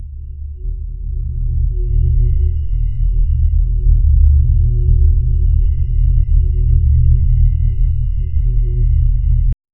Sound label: dark ominous ambience wit; alien